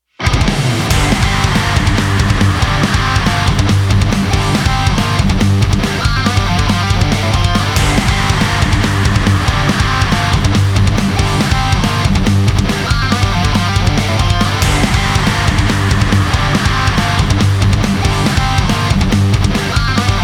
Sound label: Hard Rock 140